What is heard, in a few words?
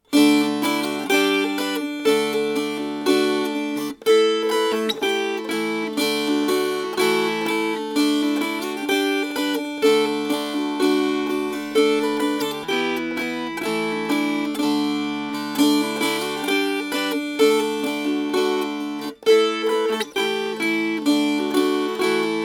Strummed; I Have A Bonnet Trimmed In Blue